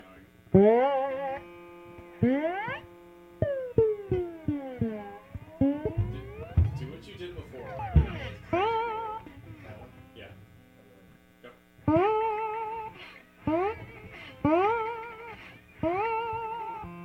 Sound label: bass merged